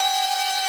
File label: synth main